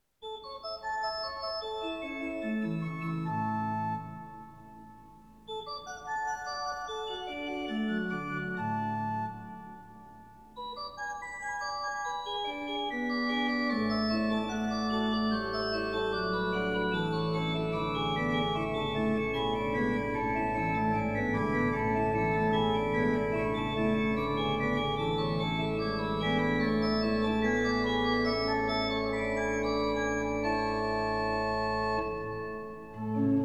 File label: Prelude, A major, ORGAN; BWV 536; Prelude and Fugue in A major; Bach; Organ Works